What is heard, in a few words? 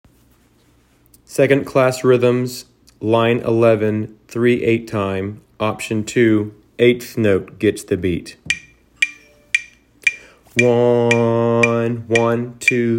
rhythms eighth note